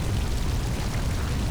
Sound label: Lava Flow Large, ambience